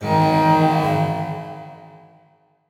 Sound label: Title Transition Bell; Adobe; Production Element